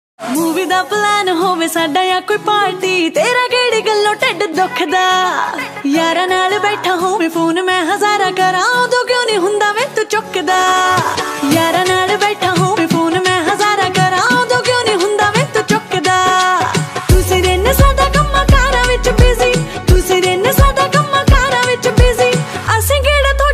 Punjabi Ringtone

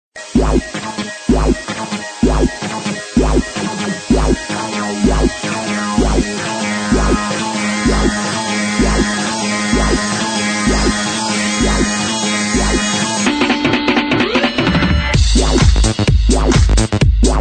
Forever Techno Ringtone

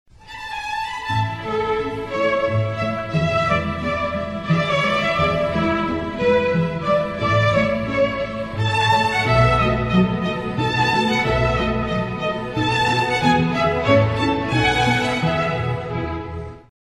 Slow Ringtone

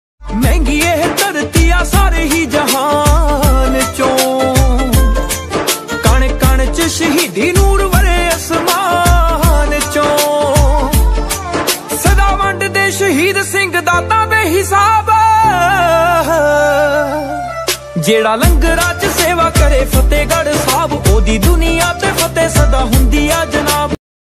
Desi Punjabi Ringtone

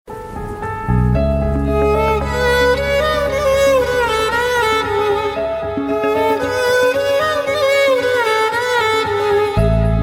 Sad Violin Ringtone

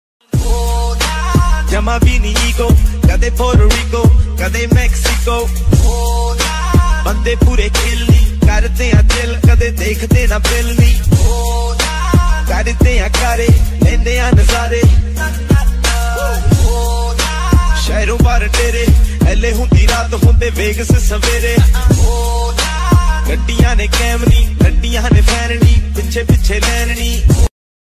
Trending Punjabi Ringtone

